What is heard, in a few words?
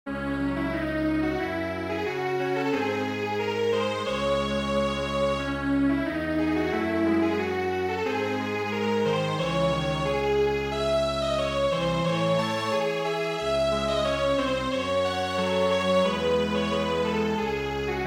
Strings Quartet, traditional; The Frost